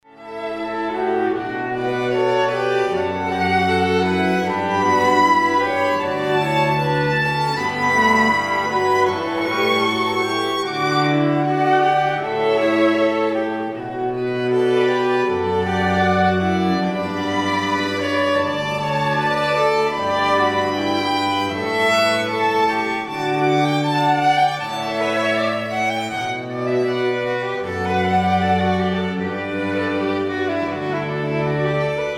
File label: Elegie Quartet Live Pachelbel